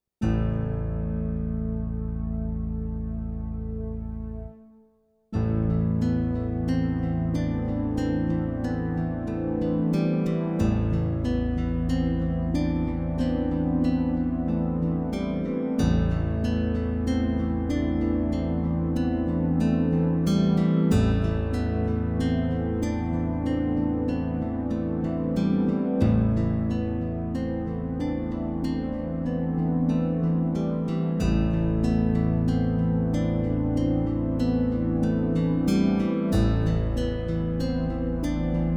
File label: Arpeggio